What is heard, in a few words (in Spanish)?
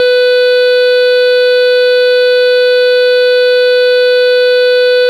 tono